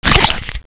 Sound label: pig bite